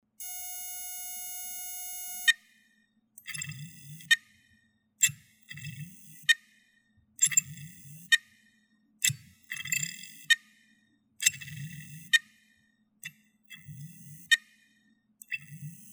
Hitachi HUS153030VLS300 HP DF300BABUF SAS Festplatte HeadCrash startet nicht piepen beep

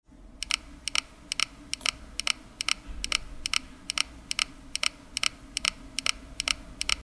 seagate ST94019A, Festplatten Geraeusche

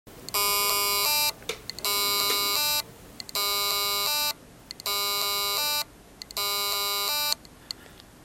Festplatten Geraeusch Melodie Western Digital WD1600BEVE

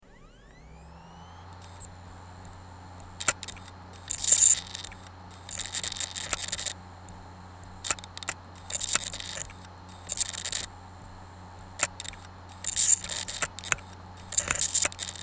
Toshiba MK7559GSXF Headcrash klicken kratzgeraeusche

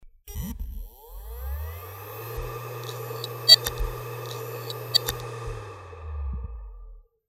Seagate ST1000DM003 klackert klickt klickgeraeusch festplatte wird nicht erkannt

